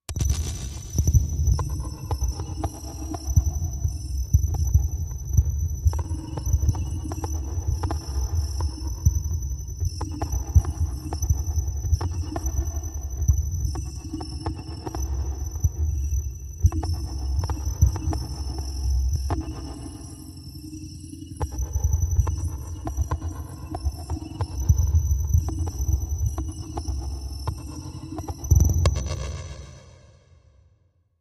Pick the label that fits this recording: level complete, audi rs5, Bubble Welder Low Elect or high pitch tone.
Bubble Welder Low Elect